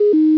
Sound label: wrong